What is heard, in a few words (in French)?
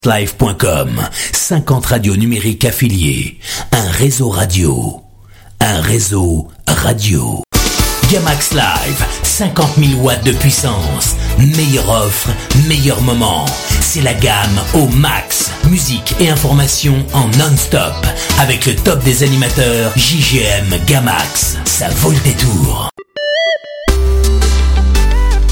Live stream; La Gamme au Max Live